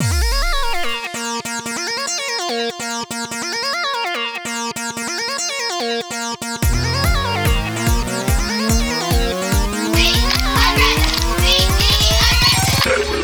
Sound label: rise; Intake